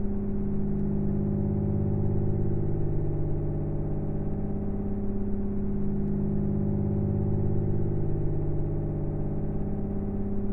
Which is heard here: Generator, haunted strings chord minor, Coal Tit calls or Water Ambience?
Generator